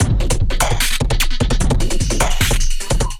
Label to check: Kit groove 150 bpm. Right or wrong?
Right